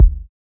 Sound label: Kick; Samplepack Produtique